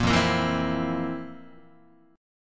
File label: F Major 7th Suspended 4th F, Guitar; strummed